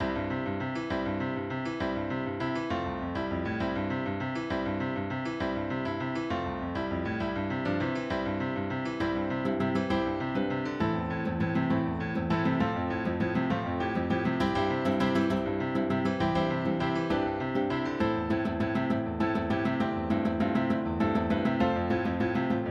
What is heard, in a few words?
TENOR spanish instrumental; twelfth night audition material